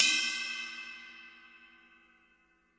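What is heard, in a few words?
classic hip hop Cymbal; STYLE KITS (Hip Hop, Etc); Classic Hip Hop Mega Kit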